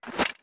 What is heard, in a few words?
Mask Off; Take off a regular mask; Take off a regular mask (putting on mask uses the OOT Inventory Item sound)